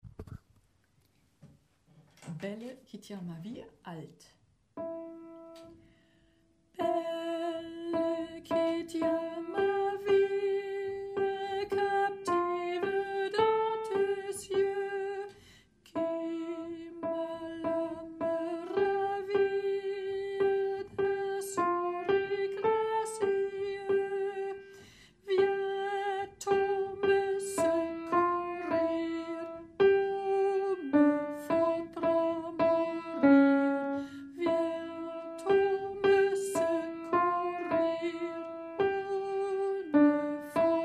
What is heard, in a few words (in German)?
Alt, Chor; Belle, qui tiens ma vie; chor ag